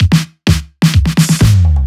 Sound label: 128BPM Latin Kit; Latin E Kit